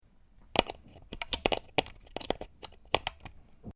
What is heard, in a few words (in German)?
stecker